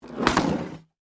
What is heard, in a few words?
Skateboard Tail, Mini, Slide, Wood, Surface; Sports; Skateboarding Sound Effects; BlastWave